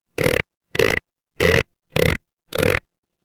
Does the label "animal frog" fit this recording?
Yes